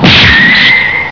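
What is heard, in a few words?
fist heavy hit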